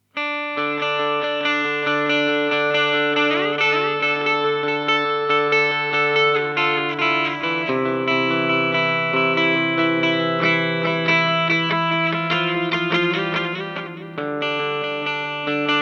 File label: Boss SDE 3000D hi cut